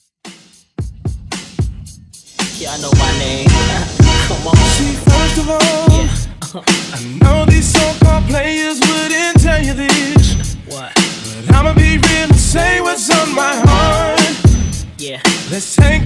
Oldschool 90s Extended 112 BPM

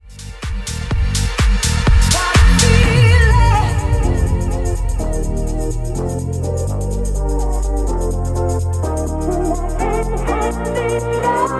Funky House Intro; Remixfreakz

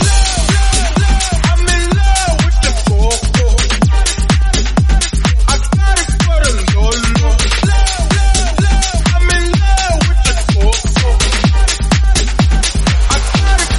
126bpm Abm

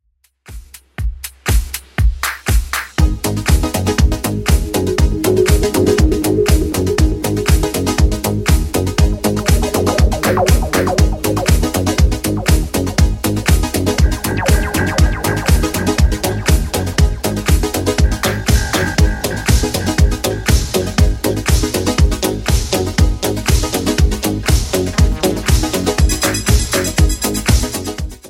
80s Redrum 120bpm

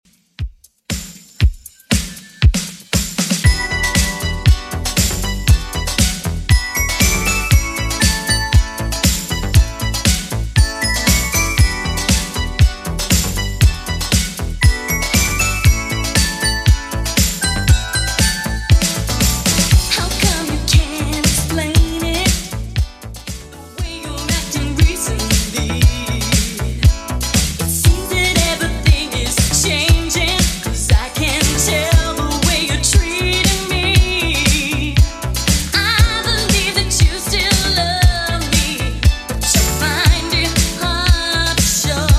80s Redrum 118bpm

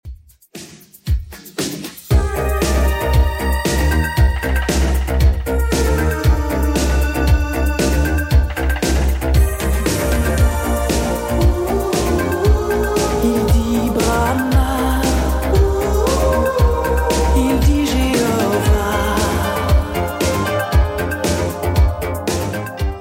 80s Redrum 116bpm CLEAN

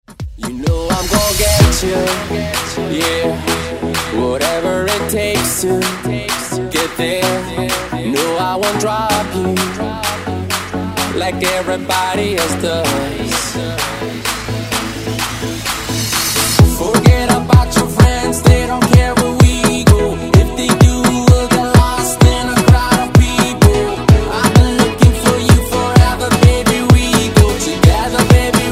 Afrobeat Redrum

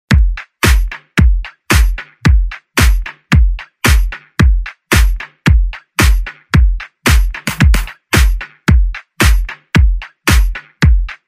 Top40 Redrum

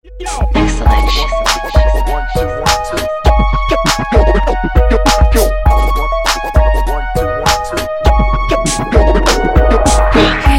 Megamix

